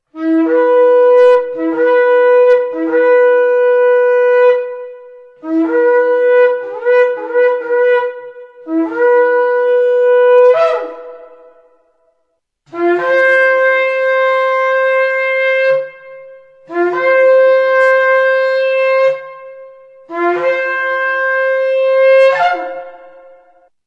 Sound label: shofar